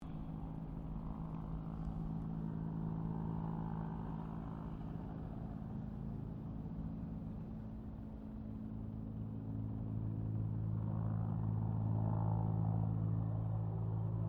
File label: HELICOPTER BELL 204 FIREFIGHTER MANOEUVRING DISTANT